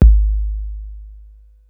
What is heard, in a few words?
KICK, Hip Hop